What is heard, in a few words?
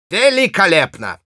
Spy positive vocalization, ru; Великолепно!